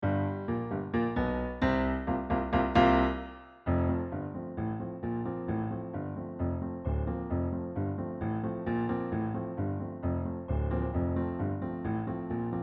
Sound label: Piano Track With Second Pause; 15 Second Pause